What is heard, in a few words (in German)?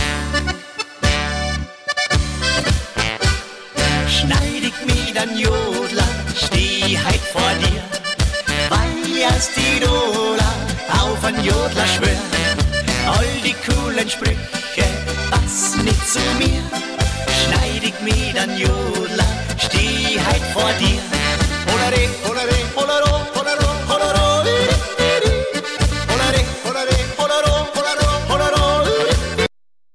Jodler